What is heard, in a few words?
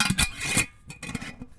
Metal Lid; BB Retail Therapy Sample Pack